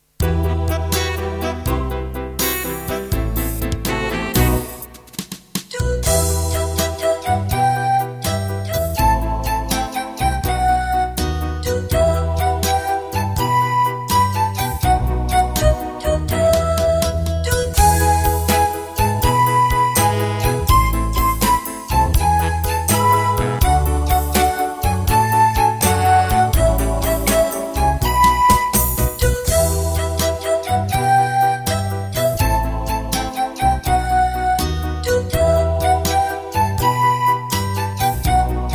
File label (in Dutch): melodie